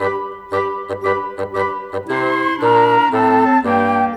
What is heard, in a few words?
Winds, Orchestral, Rock Pop; Sonic Foundry (Sony Creative Software); Orchestral Series VOL-4 - Rock and Pop